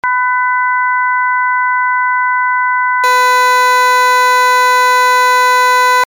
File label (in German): Power Chord Anregung